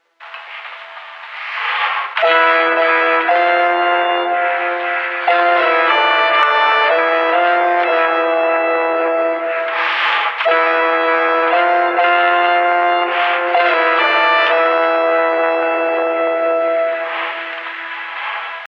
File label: melodica; tubetech